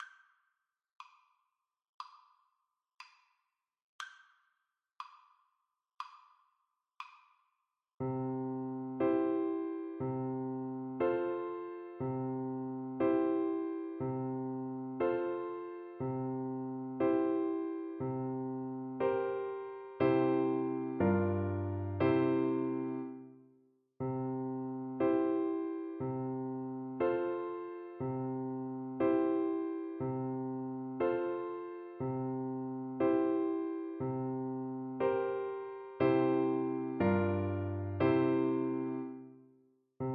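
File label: recorder karaoke; Slow Playalong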